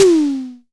Tom Fat Med, Tama TS-206

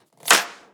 Foley Snare

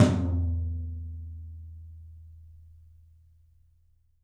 13 TOM, 3D Drums; 3D Sound

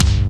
KIK GRUNG, Dance Kicks; Roland L-CDX-01

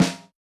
Yamaha DJX 2B, SD Jazz L

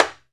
MUTED RIM, Timbale; Timbale Battery

